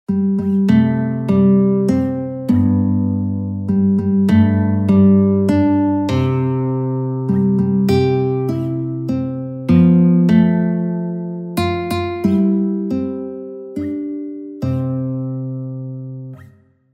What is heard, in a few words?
happy birthday to you guitar solo; easy guitar tab solos